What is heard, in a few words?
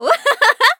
tracer laugh; tf